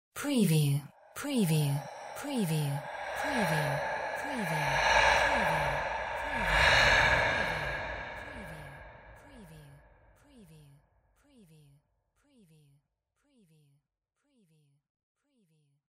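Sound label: sci fi whispers spooky reverse; windy beach audio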